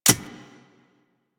Spear Reload; Weapons; Warframe; Tenno; Priest Spear

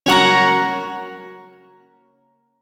Yo Chord I; Warframe; Duviri Shawzin

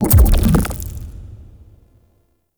Sacrifice Vitruvian Device Symbol Open Transition; Warframe; Quests; Chamber

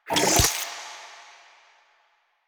Charge, Sidearm; Weapons; Warframe; Tenno; Wraith Sidearm; Epitaph Prime

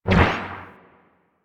Dash Bubble Start; Powers; Warframe; Focus; Operators

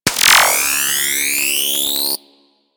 Burst Laser Charge Up; Warframe; Teralyst; Ropalolyst; Abilities

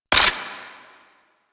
AK47 Low Clip; Warframe; Tenno; Weapons

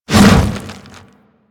Leap; Warframe; Infested; Charger; Attacks

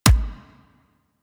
Weapon Punch Pistol

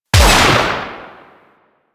Heavy Shotgun Alt Shot; Weapons; Warframe; Tenno

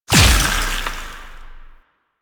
Impact Large; Weapons; Warframe; Duviri; Incarnon Adapters

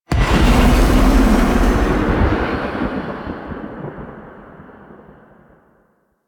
Spawn Stinger

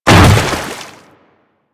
Hammer Boss Step Water, footsteps; Warframe; Grineer

